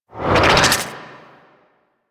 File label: Tendrils Cast; Warframe; Warframes